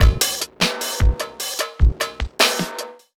Beat, Futurist Drum & Bass; Drums & Percussion

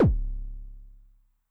Bass Drum Pitch Depth, 909; classic machines; BD - BASS DRUM

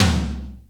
Low tom; Zoom RT-234; Kit A

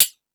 House Machine, Drum Machines

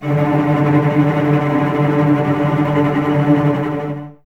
D 3, String Ensembles Cellos Tremolo; Miroslav Vitous

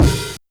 BD & HH Open H; YAMAHA RM1X

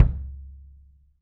KIDM, 3D Drums, MAL KICK

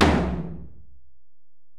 Tom, Alesis DM-PRO

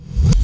KICK REVS

